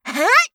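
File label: attack, Voice Line, Korean; Eternal Return; Garnet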